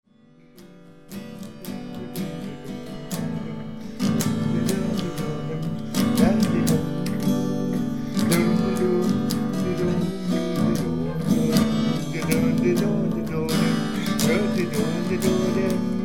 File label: guitar